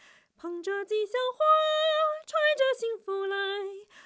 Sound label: Soprano